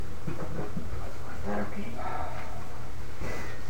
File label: A male sigh or breath sound; Athens IL Bitterroot